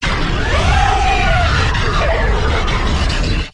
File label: snarl, monster